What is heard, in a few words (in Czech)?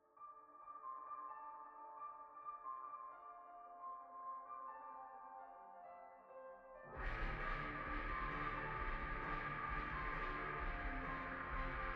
sentimentalni